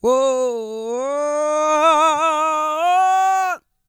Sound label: GOSPEL